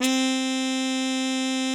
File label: MUTED C3, TRUMPETS; Greytsounds VOL-1